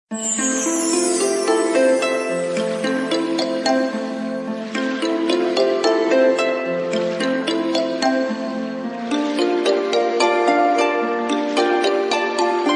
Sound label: Oriental Air, alert tone; Pantech; P2030 Breeze 3; Alert Tones